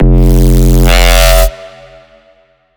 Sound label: Scream bass F